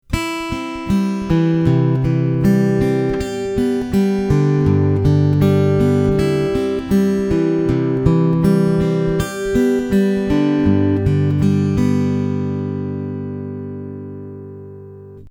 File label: easy fingerpick, acoustic guitar